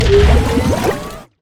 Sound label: hydroblast; nami base